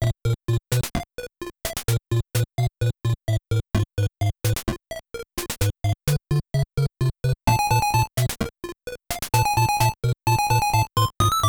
Climbing; Sakigake Otoko Juku Shippu Ichi Gou Sei; NES

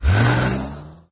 Pickup Leaving Faster

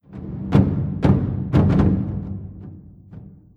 Level Up

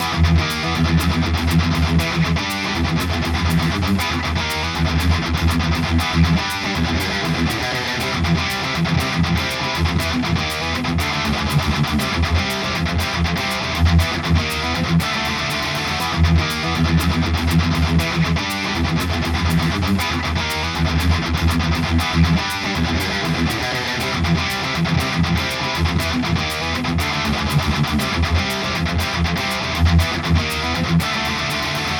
Bard Playing Loop

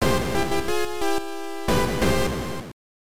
Team Switch BGM

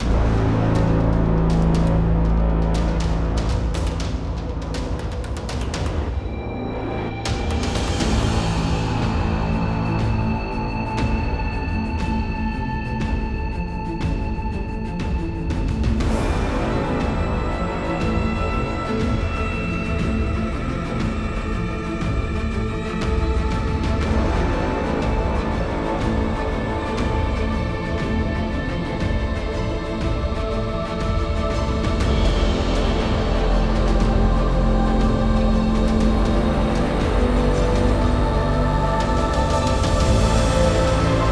Fighting Phase Intro; Nebulous Fleet Command

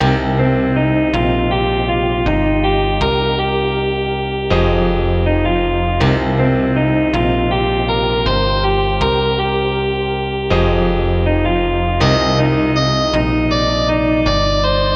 Demon Jazz; Momodora II; Rahart Hall

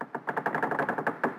Fountain; The Princess and the Frog; wii windows; Hub Restaurant; Fountain Close Creak

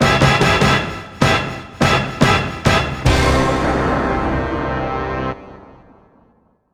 Lose; Daikaijuu Battle Ultra Coliseum DX Ultra Senshi Daishuuketsu; Wii

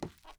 wood step

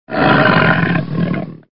tiger growl

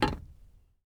glass land; teardown ps5 windows xbox series xs gamerip 2022

